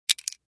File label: sound jetton; forestparty